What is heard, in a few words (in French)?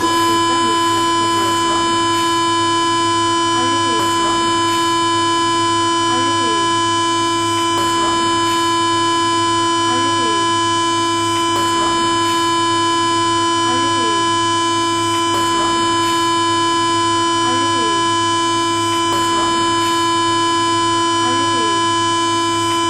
ronfleur